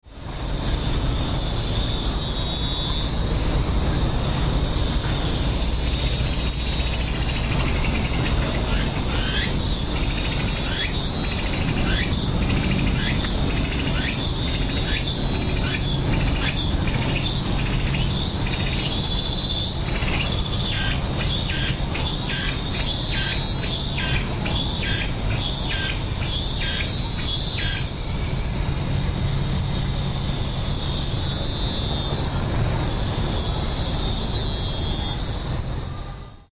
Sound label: mechanical insects